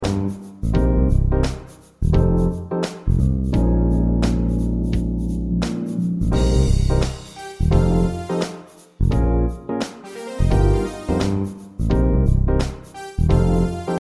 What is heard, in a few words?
Accompaniment